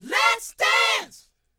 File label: LET, Vocal, R&B Dance, DANCE 120